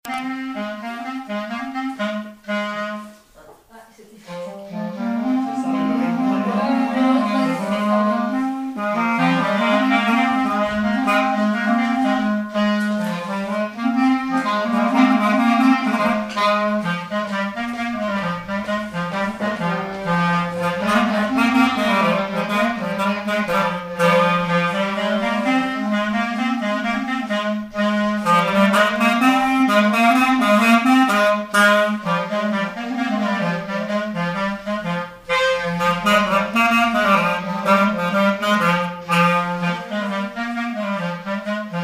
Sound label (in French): jeu ronde, clarinette